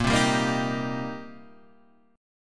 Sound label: C Minor 7th Bb